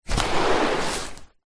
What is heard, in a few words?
bag teachers fabric containing books and paperwork drag on ground; foley